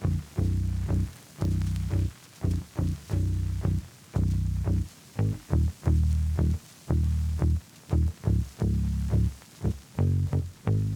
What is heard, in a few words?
Classic Melody Loop - 175 BPM D# Min Bass; Melodics; Loop Stems; Cymatics; Eternity Classic Melody Loop 9